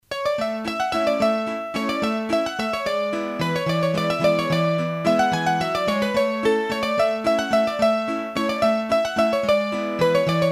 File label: Mazurka, hurdy gurdy; Massif Central; Mazurkas